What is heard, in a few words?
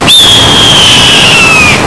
screech